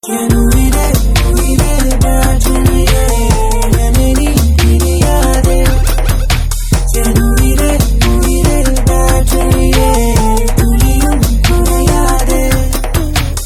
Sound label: bg tone; tamil; enthiran 2, 2.0